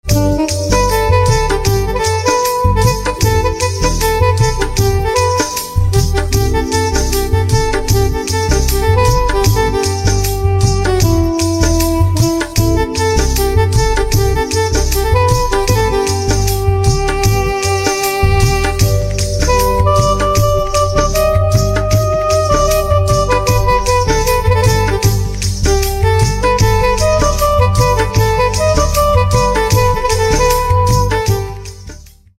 Saxophone

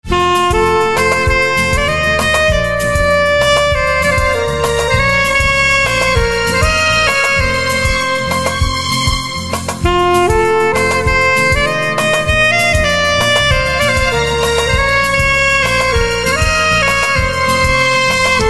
Saxophone; milind soman

